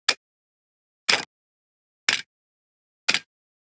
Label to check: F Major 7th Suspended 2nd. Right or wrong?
Wrong